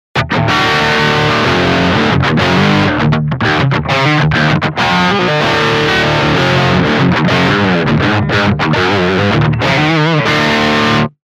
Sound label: lead, sparkle